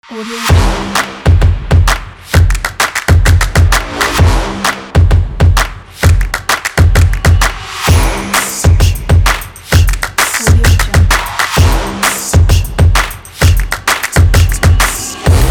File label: advertising